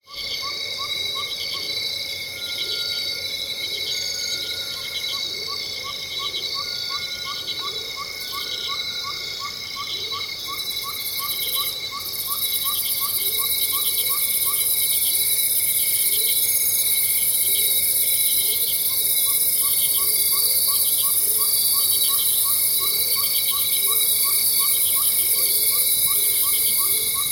nature tropical rainforest ambience venezuela loud insects; felix blume